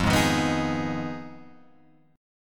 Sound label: F Minor 7th Flat 5th, Guitar, Open F; Fm7b5; strummed